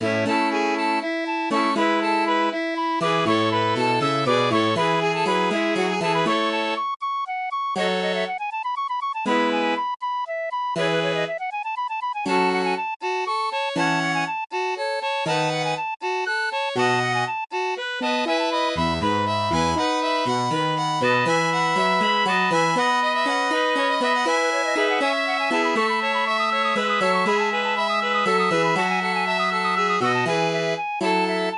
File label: MIDI